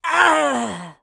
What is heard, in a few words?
Bazooka Death